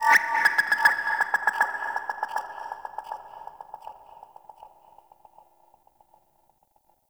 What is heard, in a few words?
Grineer Frogman Attack, Vocals; Warframe